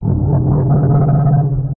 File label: Riser Sci Fi Abstract Intense Overdrive Short; Skyes Audio